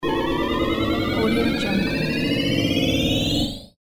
robot power up

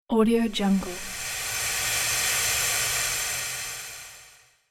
egyptian sands transition

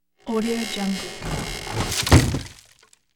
slow wood creaking and falling; hollywoodedge

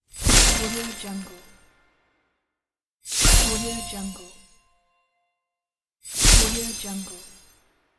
magic explosion

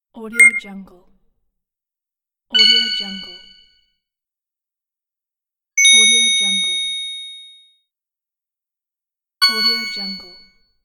bing approve

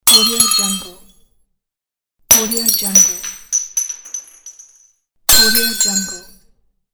glass breaks; medusamusiclab

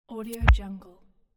sub frequency blip

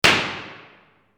metallic echoey impact

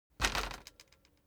transportation bicycle street bump rear

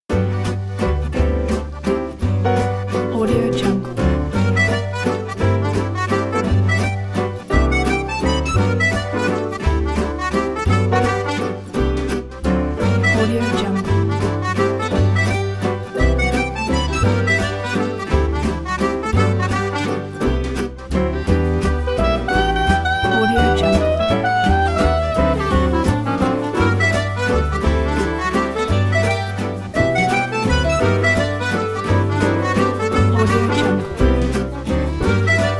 jazz waltz cooking

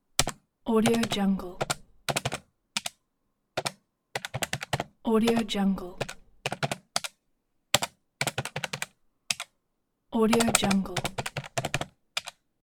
calculator

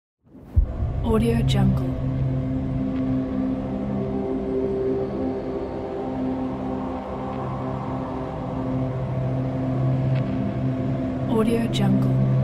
drone fear

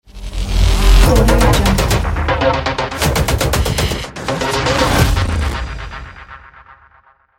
cyberpunk logo

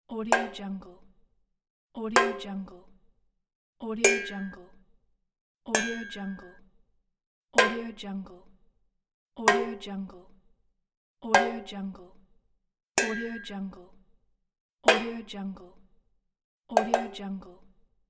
metal pan hitting

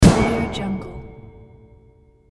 sledge hammer on sheet metal; hollywoodedge

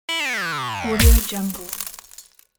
cartoon run down snap rusty; cinematic-designs

